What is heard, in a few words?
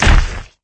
step